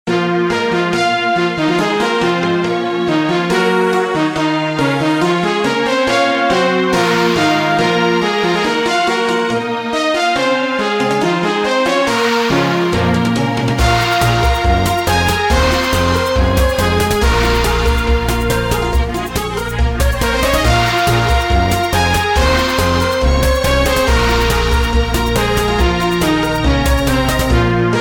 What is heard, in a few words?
Music Cave